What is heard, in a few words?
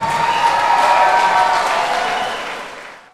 Cheering Crowd Gym